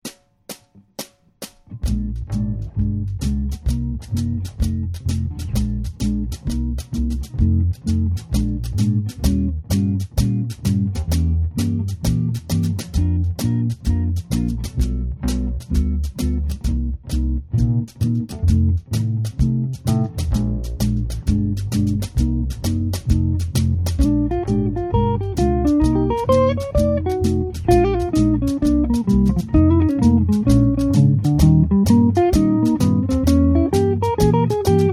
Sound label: blues, guitar